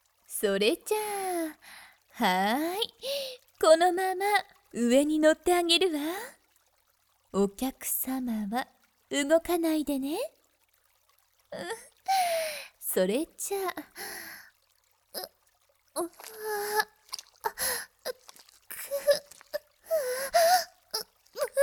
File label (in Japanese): 騎乗位素股 甘噛み とろける ディープキス